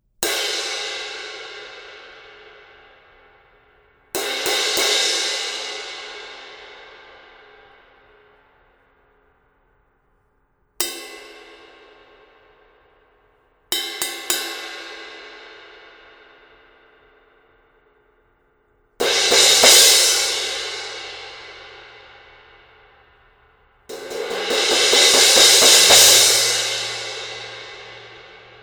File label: Cluster Crash, cymbal